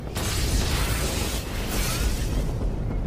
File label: long dash